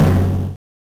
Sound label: Timpani; M8; Genesis